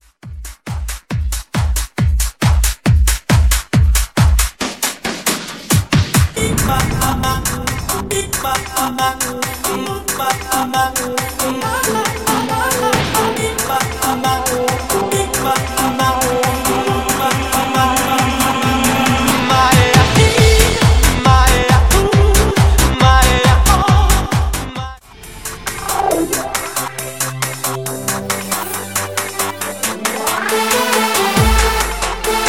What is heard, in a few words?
Tiktok Dance; Remixfreakz